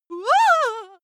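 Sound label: female scared comic, screams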